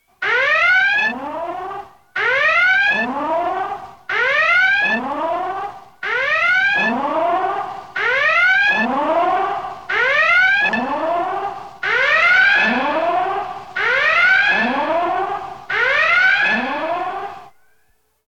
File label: Red Alert Klaxon; Star Trek Original T. V. Series Sound Effects